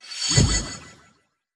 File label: Planet Transform